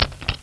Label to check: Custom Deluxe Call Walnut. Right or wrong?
Wrong